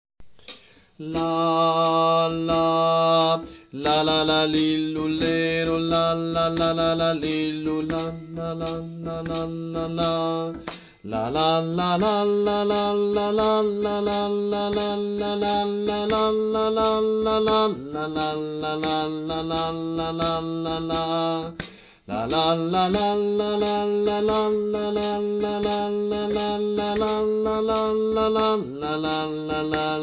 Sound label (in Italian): Contralti; Coro Isola del Giglio; Il Trescone